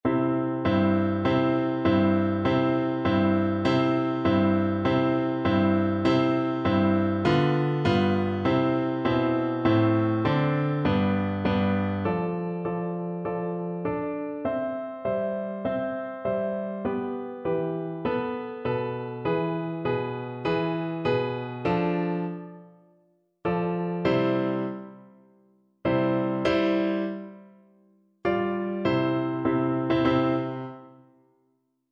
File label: Bassoon; bizet; carmen